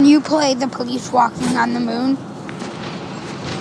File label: music